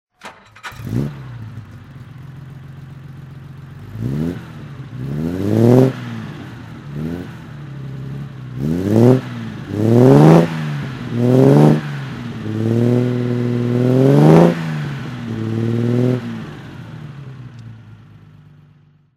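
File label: ac aceca throttle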